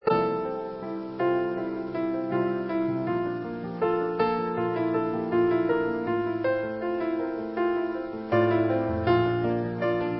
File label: Delicate Piano; Mit 32kbps codiert. Nur um euch deutlich zu zeigen was MP3 wirklich mit Audio macht. Die Aufnahme klingt irgendwie verrauscht.